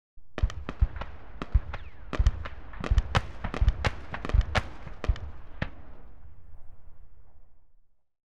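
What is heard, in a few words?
Warfare, Scimitar, Firing On Automatic; BBC Sound Effects Library; Explosions Guns Alarms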